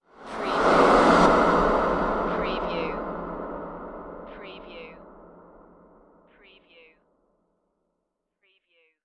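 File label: Production Element Reverse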